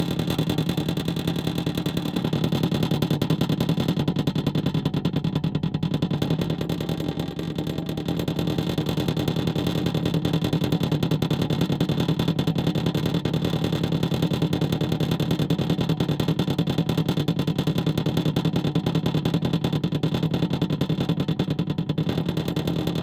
Vibration, Panel, Metal, Rattle, Clack, Distinct, Variable, Loop; Hzandbits